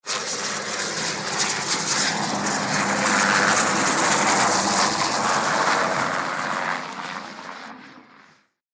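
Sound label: Heli Traffic Approach By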